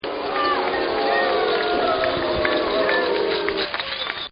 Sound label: CROWD BOO